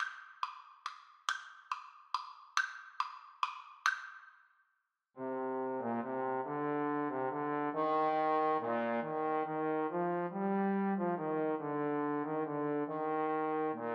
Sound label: Trombone Duet